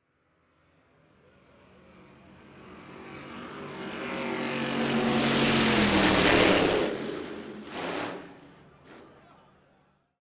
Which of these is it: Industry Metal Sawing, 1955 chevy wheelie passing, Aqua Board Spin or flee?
1955 chevy wheelie passing